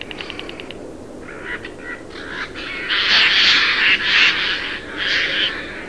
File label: garrulus glandarius sójka